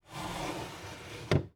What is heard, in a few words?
Coffee Table Drawer Close; household